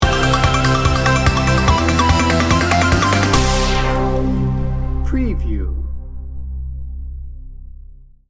science and technology sting bumper

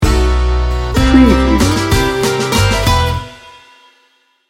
italian romance sting bumper

